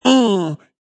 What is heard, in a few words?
Vocal Deep Grunt 110, Funk vocal loop sample